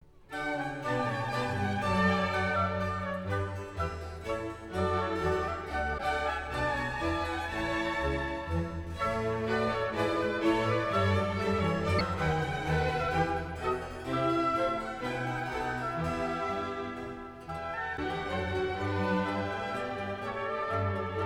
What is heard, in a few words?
Suite for Orchestra Overture No 1 in C major Menuet I II